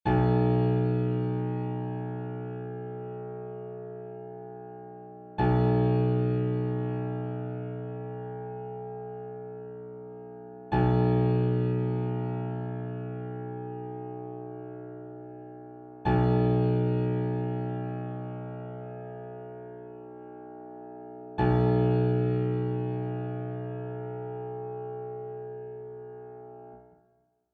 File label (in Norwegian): stemmetoner for gitar b1 for syvstrengs gitar